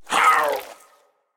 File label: minion death grunt; smite; npc; roman archer